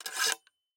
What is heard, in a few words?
gl remove; gmod; arccw uc commons attachments